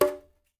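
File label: brush conga; Spectrasonics; Liquid Grooves 1 & 2; CONGAS+BGOS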